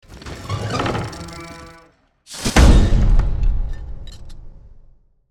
Heavy giant robot footsteps single step; robotic

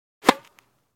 Tennis ball hit

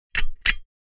Silicone sealant gun single application; hand tool

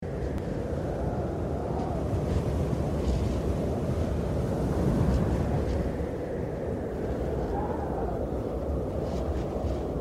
Blizzard icy wind snowstorm ambience loop

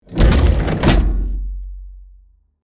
Machine lever pull

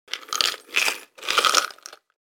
Searching jewel in jewelry box

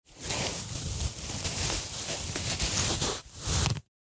Burlap sack rustic scrape

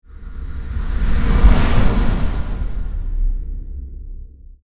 Spaceship pass by fast

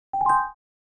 Simple message notification

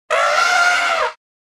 African elephant trumpeting

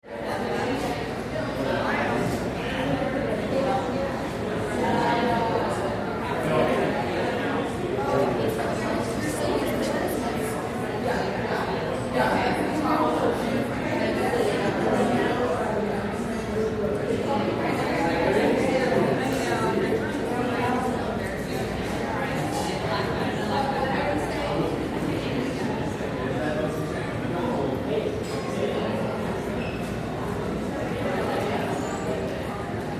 Crowd interior museum children reverberant

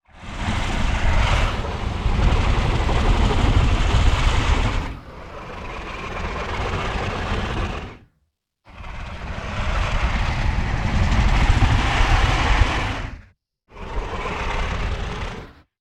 Dragon snoring sleeping

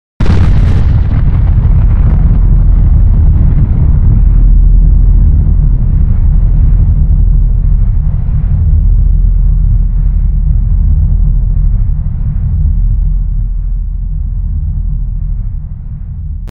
Distant explosion deep low rumble long lasting

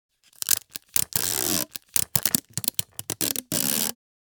Scotch tape dispenser handling pull and tears

